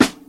old school funk snare sample C key, Royality free snare sample tuned to the C note; funk snare drums